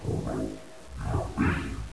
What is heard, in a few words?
idle, houndeye